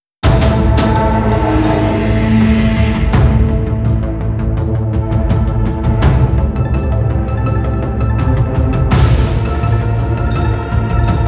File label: violin traps tension; gerilim; By Burak Sound Bank; tension gerilim vol 13